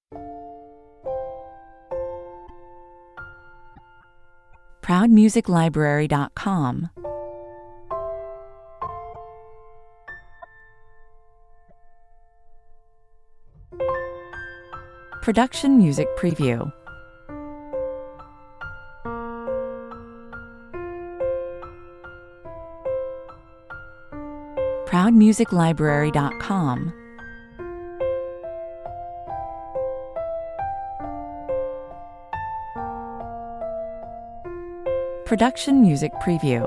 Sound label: piano only version